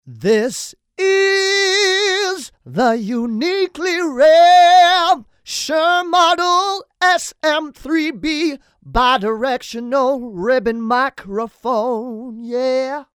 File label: sing